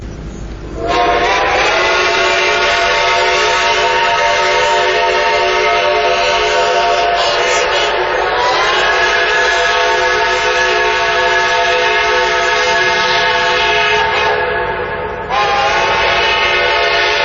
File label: horn